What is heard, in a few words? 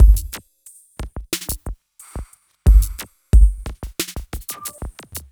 Smooth, R&B Drums